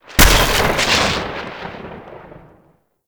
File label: Void Storm Pillar Lightning Strike; Warframe; Railjack